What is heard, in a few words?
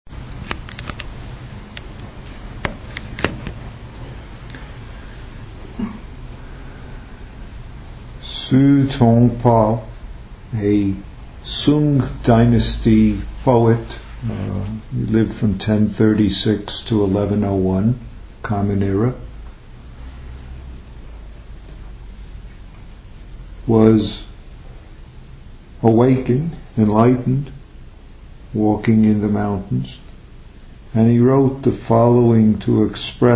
Sounds of Valley Streams; Sesshin